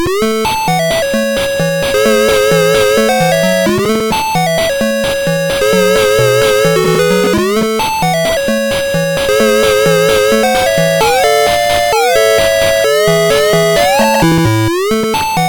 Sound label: Musicbox, sid MOS6581R4